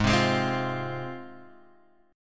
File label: Ab 6th Ab, Guitar, Standard; strummed; JGuitar